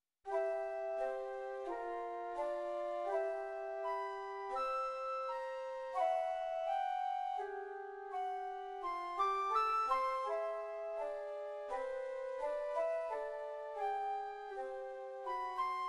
for two flutes andante tranquillo; sequences

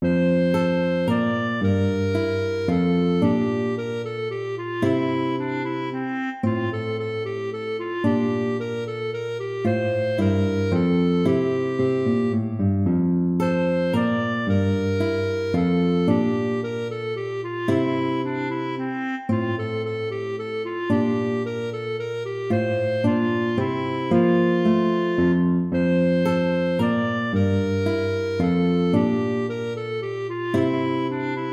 minuet major

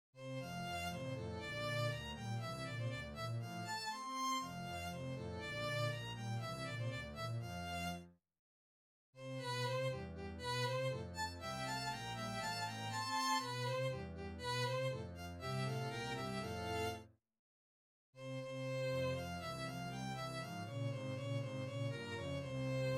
english dance; vanhal johann baptist

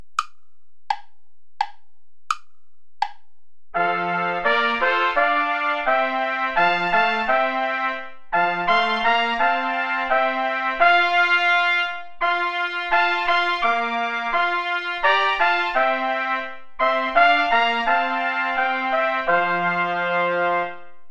play along without trumpet

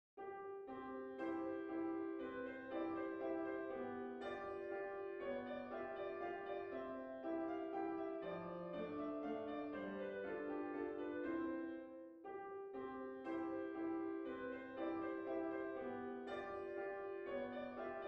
dance allemande; beethoven ludwig van

